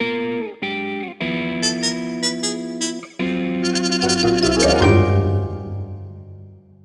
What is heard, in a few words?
Bari Sax